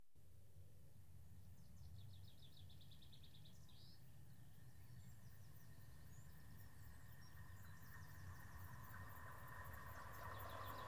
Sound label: Trains Passing Single diesel; BBC Sound Effects Library